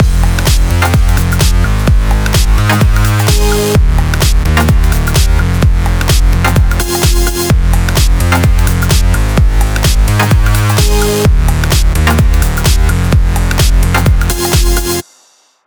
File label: Guess What Mix, 128BPM; Vengeance Samples; Guess What Kit